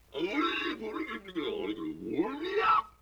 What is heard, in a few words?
LoFi Synthetic Squawk